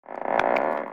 squeaky floorboard

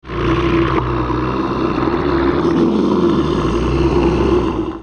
monster beast growl

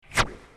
arrow fly

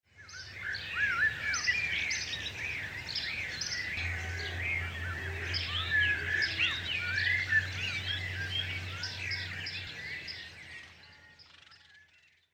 countryside

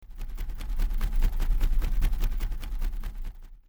birds wings faster

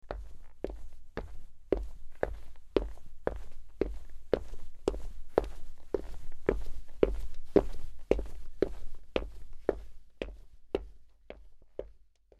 foot advance outdoors

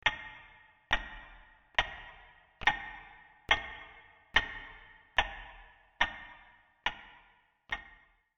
clock tic toc

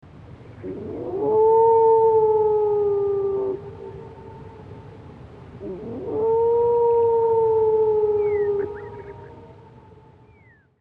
wolf howling

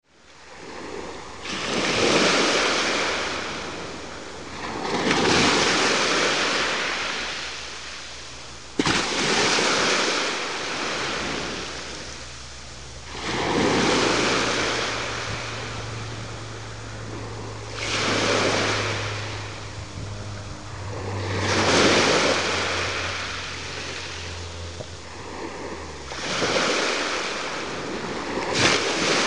waves in cornwall

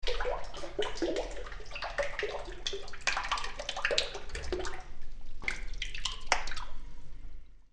cave dripping

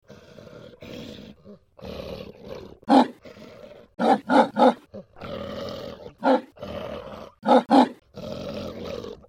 dog growl barking

